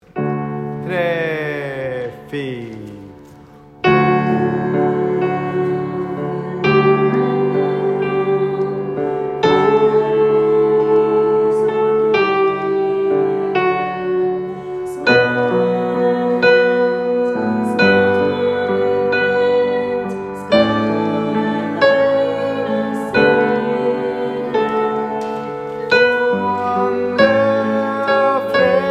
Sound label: sov til min sang tenor; kirkekoret; salmekveld